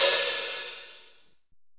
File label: DUAL OHH, LOFI HATS